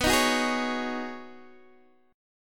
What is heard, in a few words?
B 6th Add 9th B down Guitar Open D